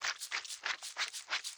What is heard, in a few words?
Slug Crawl